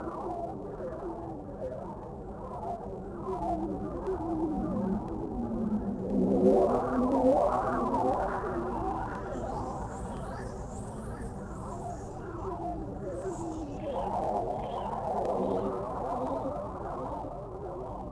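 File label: ambience; Quantum Redshift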